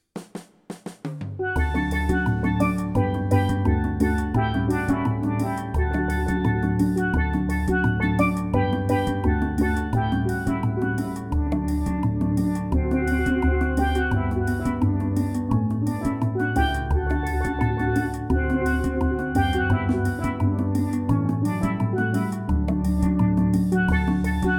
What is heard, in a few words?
solo, Steel Drum; Steel Drum Band